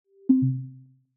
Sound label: attending window end; Apple; iOS-18; AssistantServices.framework